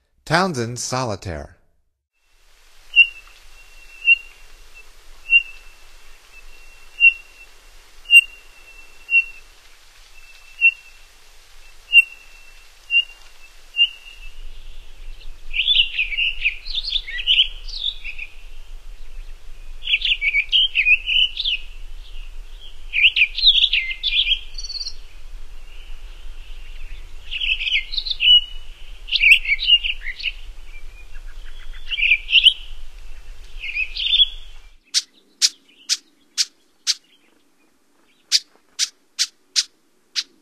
Townsends Solitaire, Songs of Birds of North America; Stokes